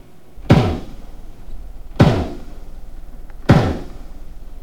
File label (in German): Rammung ohne Blasenschleier; Kein Laerm Meer